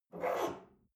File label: espresso grab from table slide soft, restaurant cafe